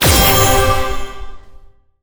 angel blast

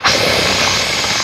boss bug hiss

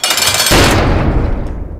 castle portcullis

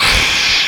boss lite hiss

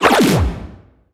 hole dive; littlehorn

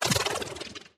teratoma bounce large

